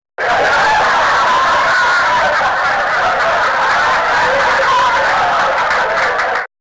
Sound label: laugh track